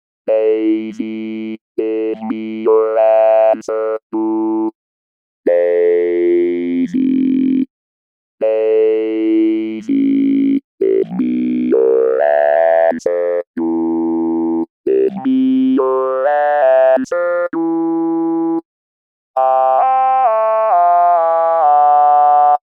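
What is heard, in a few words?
remix melody excerpts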